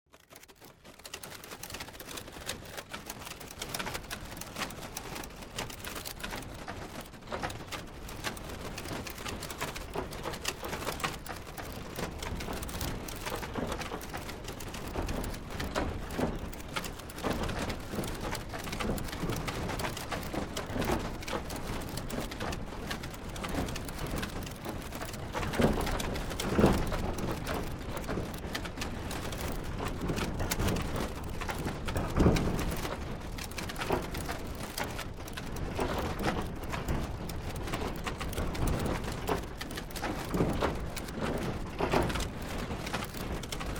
Created Ambience Solid Rain, Artificial Sound Effects; Various Ambience created through sound manipulation in FMOD